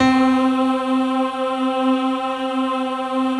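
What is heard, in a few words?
C 3, PIANO CHOIR; Best Service; Advanced Media Trax Modern Composer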